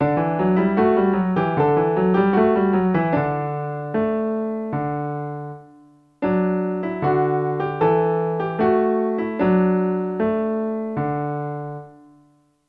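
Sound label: practice dotted 8th note in D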